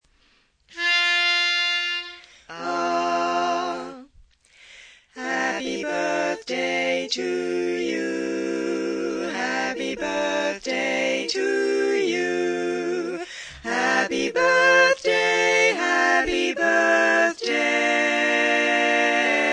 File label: Happy Birthday 4 Part; learning tracks